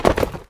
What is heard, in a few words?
Ragdoll